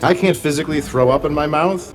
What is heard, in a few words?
throwup; ultron